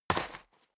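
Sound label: step boots gravel medium single left, footsteps; schritte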